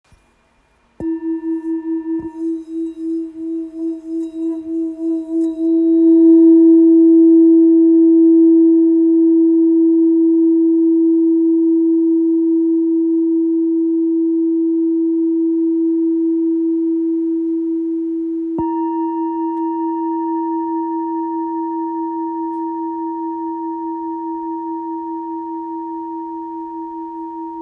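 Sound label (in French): Full Moon re diese, bols chantants tibétains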